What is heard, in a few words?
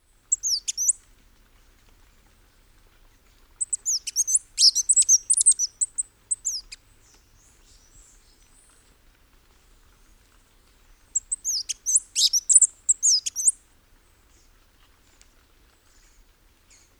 Song and calls, Birds of the Costa Rican Cloud Forest